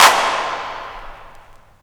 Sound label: CLAP; CLAPS; USB Soundscan vol.20; Fresh Disco House I; AKAI